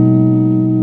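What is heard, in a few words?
A Sus Guitar; Mo' Phatt; Digital Sound Factory Mo' Phatt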